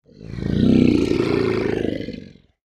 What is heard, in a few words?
Animal Lion Growl; animals; AdobeCC